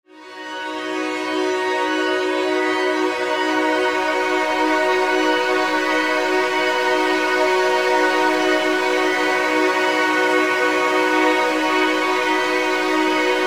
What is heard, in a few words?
fluffy winds